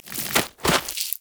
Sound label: human emote death; Elise